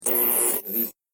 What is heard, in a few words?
Static Blast SciFi Data Transmission Tuning Zaps Off, Static Blast Data Transmission Radio Tuning Zaps Off; Scifi Technology; Sound Design